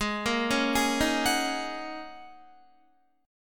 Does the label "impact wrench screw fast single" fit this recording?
No